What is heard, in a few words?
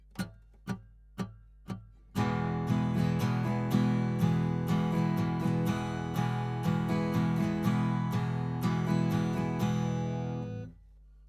Strum 120bpm